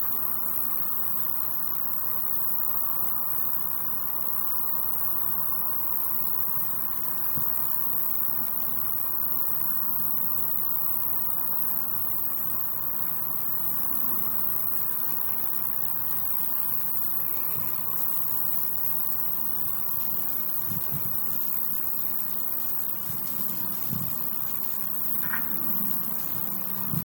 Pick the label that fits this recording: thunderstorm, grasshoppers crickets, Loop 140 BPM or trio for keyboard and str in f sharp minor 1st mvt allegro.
grasshoppers crickets